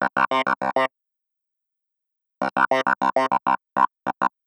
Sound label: Clavinet; клавинет